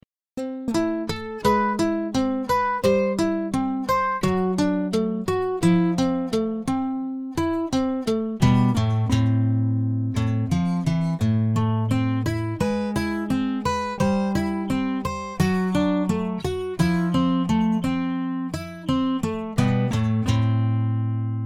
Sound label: sunbird; AcousticSamples; Gibson